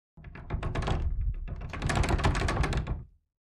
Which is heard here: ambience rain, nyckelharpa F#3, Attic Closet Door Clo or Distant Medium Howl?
Attic Closet Door Clo